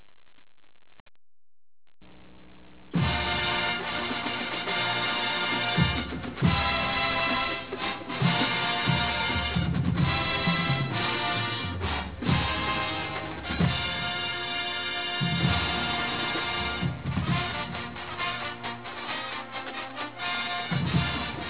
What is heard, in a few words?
Fanfare